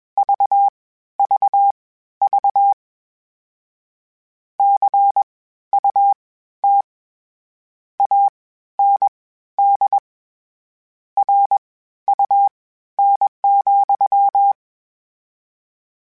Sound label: Word Pairs, Morse Code; HamRadio